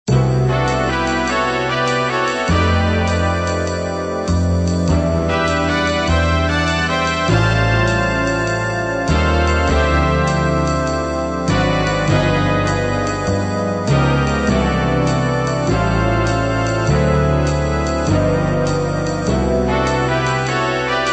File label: triad